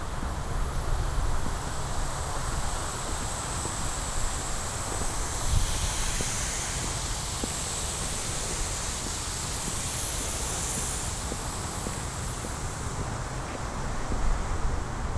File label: Walking past sprinklers, mailbox, binaural